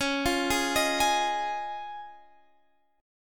Db Major Add 9th, Guitar, Standard; arpeggiated; JGuitar

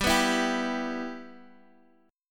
B Suspended 4th Sharp 5th G

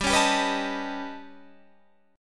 A 9th Flat 5th G, chord

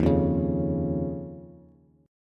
Eb 7th Suspended 2nd, Bass; Eb7sus2 strummed; JGuitar

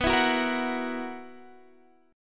B Major 7th Suspended 4th B, Guitar, Standard; strummed; capo 9

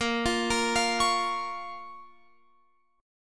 A sharp Minor, down, Guitar, Open F; arpeggiated

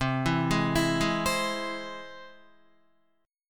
C Augmented, Guitar, chord; arpeggiated; 7,7,8,9,8,8; 4F,4A,5C,5G,5C,6E; JGuitar

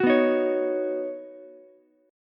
B Major Add 9th, Ukulele; strummed; 11,11,11,16; JGuitar

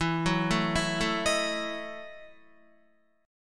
E Major 7th Suspended 2nd Suspended 4th E, Guitar; arpeggiated; chordshape

